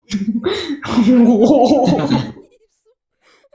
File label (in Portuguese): risada do hohoho